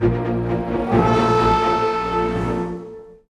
MUSIC Lose Short; Incredibles; GameCube

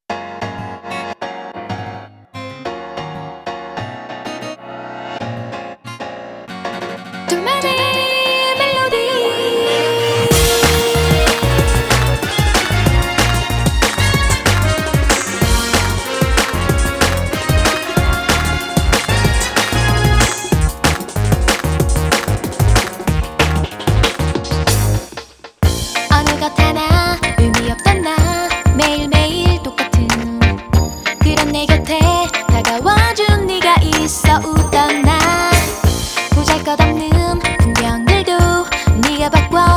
Melody; DJMax Portable Black Square

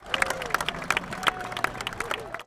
AUDIENCE Claps and Cheers stereo; Infernal Radiation

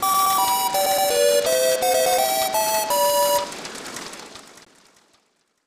VS Mode Start Jingle; Pachinko Renchan Tengoku Super CR Special; SNES

